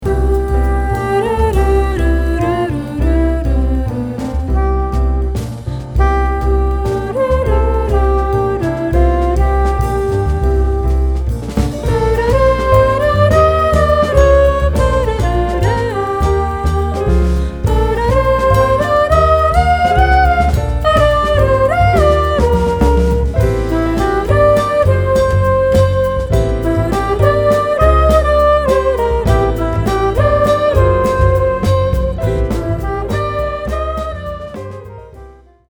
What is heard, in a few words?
INSTRUMENTAL JAZZ